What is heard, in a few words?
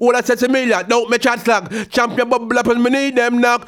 ragga in B phrase